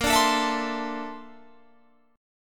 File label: Bb Major Sharp 11th, Guitar, Open G